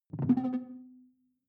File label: sci-fi alien bounce; swooshes-swishes